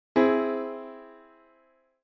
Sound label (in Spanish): Acorde de 5aum, guitar